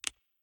Keypress Standard; Newman CM810

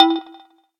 electric, keytone

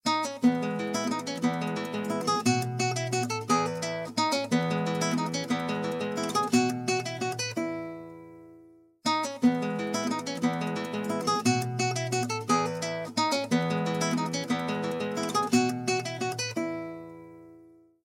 Smooth Life, ringtones; Infinix Smart 2 HD

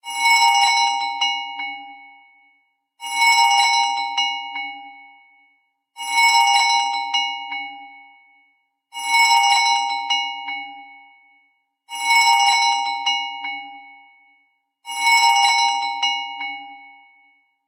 Old Alarm clock; ZTE Grand-S-2; alarms

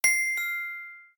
Flicker; Samsung Gear S; Alerts

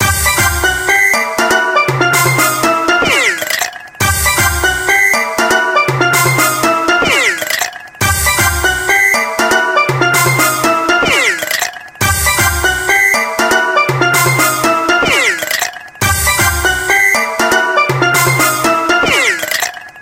Caribbean Ice, ringtones

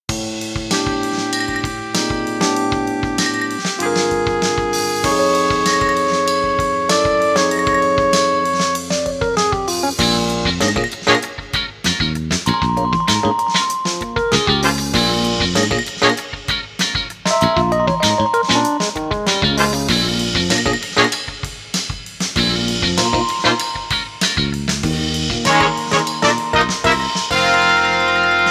Funk, alarms

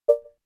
Camera Popup; LG SU780 Lollipop 3

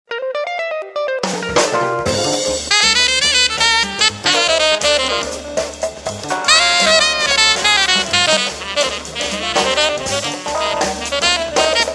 Jazz Bar; Samsung GT-C3510 CorbyPop Genoa

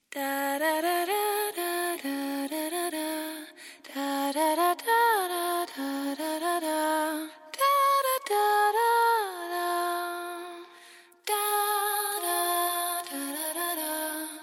Ringtone, ringtones

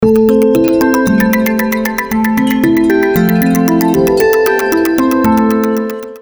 Staircase, ringtones; Alcatel; 5049W-T-Mobile-REVVL; FX3-Remix1

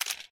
camera click; SKY IM-T100K VEGA No.5

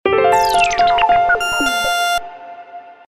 warning; Telekom - Croatia

